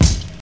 rich high end kick drum one shot g key, Royality free kick drum single hit tuned to the G note